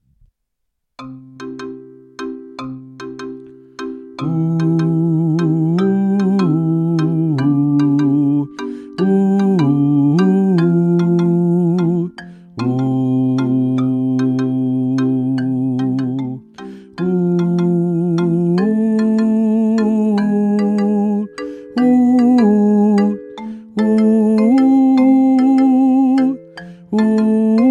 accompagnement, Chant

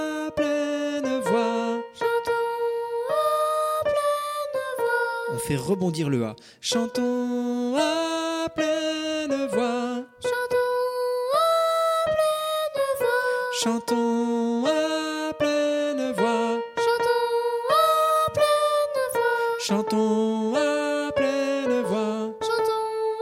hop hop opéra échauffement

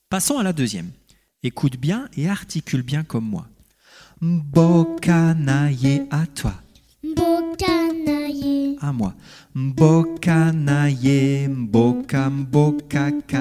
apprentissage chant

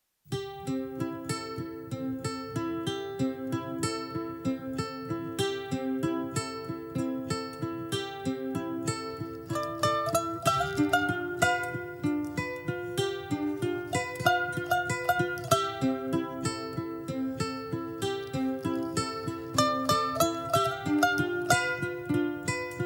ukulele

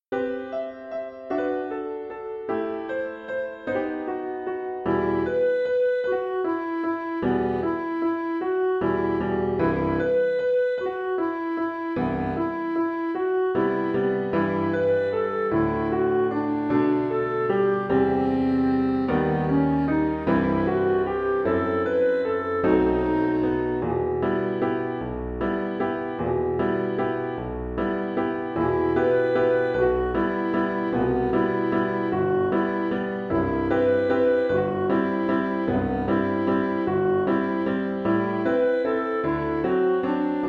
Mes joies quotidiennes Piano

